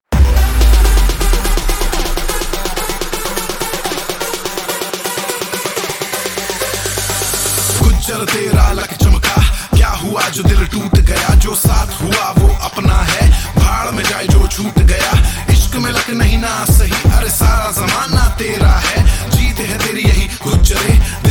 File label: Rap Battle (Male)